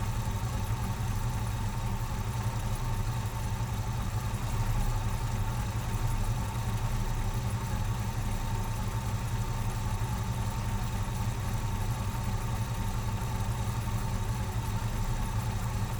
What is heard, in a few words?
vehicle classic idle; teardown; ps5 windows xbox series xs